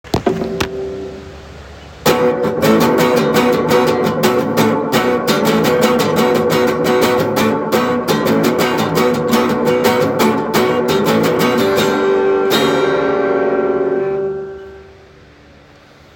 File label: guitar